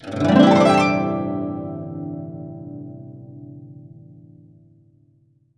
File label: GUCH GLS, GUCHIN; INIS - Opium; GUCHIN-GLS